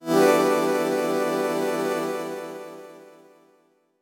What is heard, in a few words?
Synth With LFO